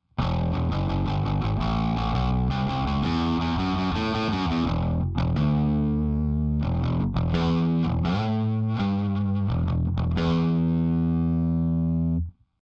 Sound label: bass pod xt